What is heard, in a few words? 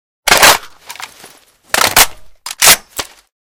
reload empty, weapons m14; M14 Reanimation; librarian m14